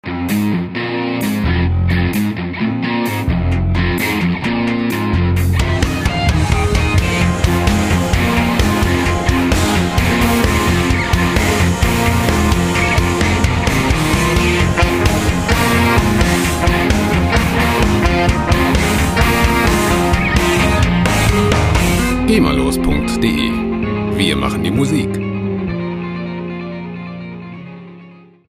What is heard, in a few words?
rock